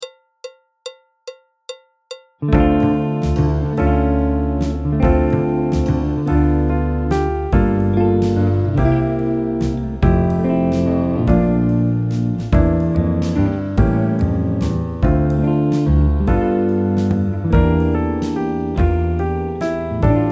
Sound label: piano; Jazz Syllabus Level 3